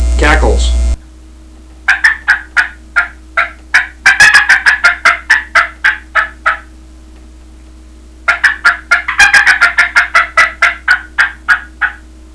dia cackles, turkey; dixie

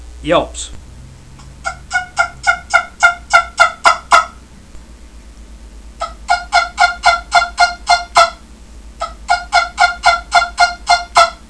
3pc yelps, turkey hunting; Grand Slam

